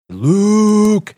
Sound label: chanting luuuuke